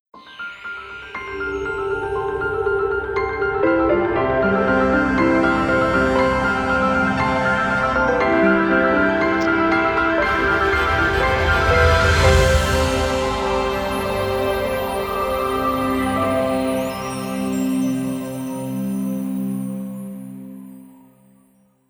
festival jingle

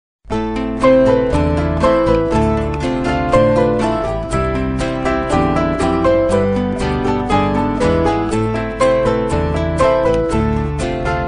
winding jingle

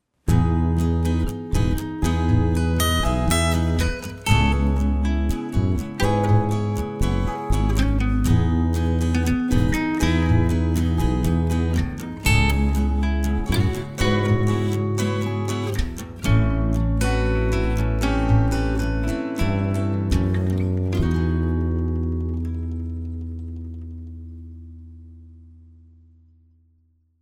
acoustik jingle

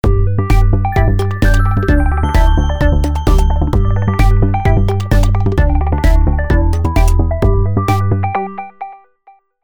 retro gaming jingle

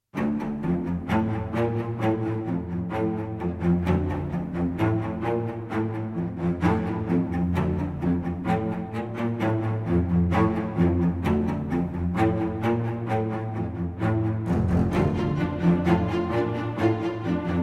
orchestral; language

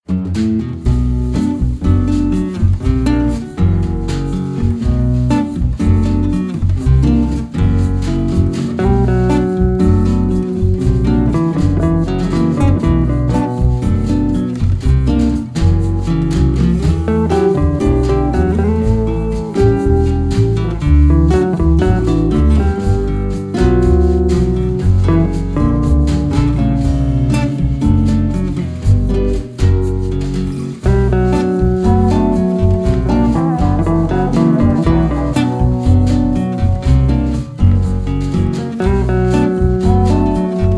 escapade guitare version, balais

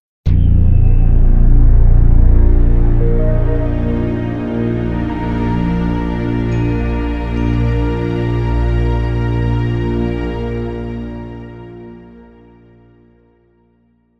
nap jingle

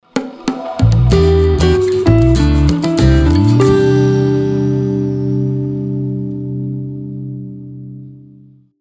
positive guitar tag

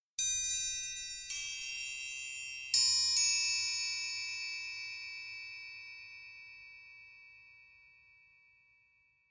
triangle ensemble

